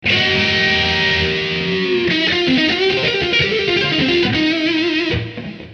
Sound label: 110-125 lead